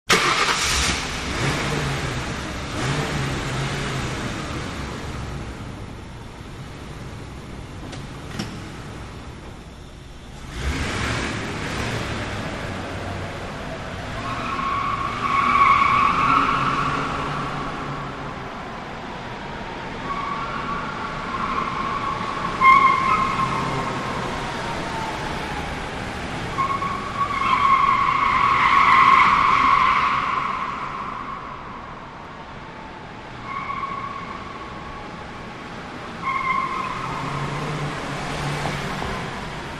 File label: Lincoln Towncar Corn, skids autos